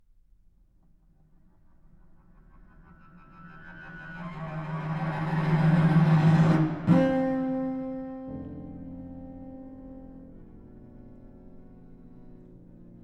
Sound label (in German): violoncello u klavier auberst ruhig